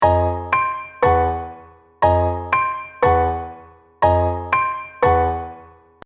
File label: Fifths Tone Row orchestrate